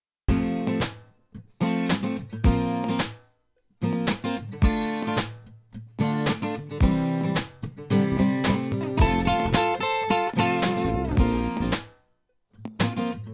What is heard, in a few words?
Instrumental